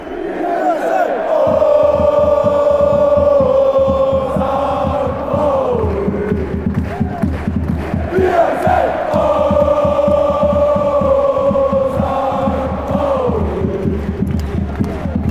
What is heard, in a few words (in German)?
Wir sind ooooo St. Pauli, fanchants